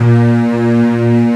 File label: STRINGS A#1, Analog, Synth; E-MU Formula 4000 Series Vol. 3; Analog Odyssey; Synth Builder